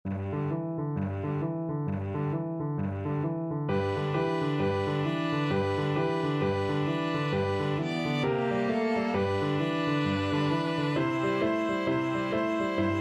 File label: jingle bells boogie brass, piano quartet